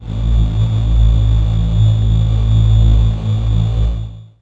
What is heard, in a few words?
syn a0, FAIRLIGHT; ProSamples vol.55 - Retro Sampler